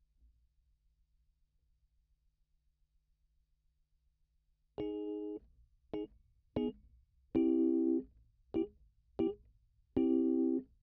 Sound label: Rhodes